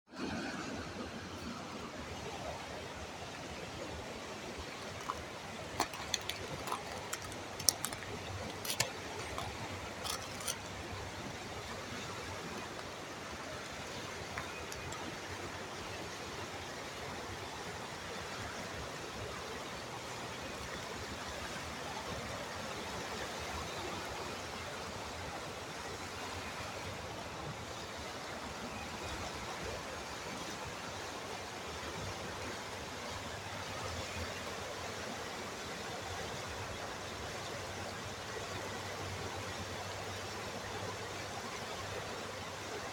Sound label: falls sledding hill; berea